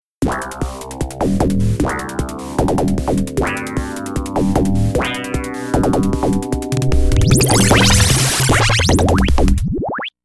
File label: Carnival Stage Multi-ball Start